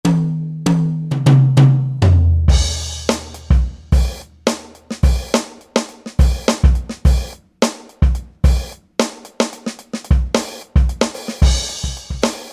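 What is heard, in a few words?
Vintage Funk KIT, Roland SRX-01 Dynamic Drum Kits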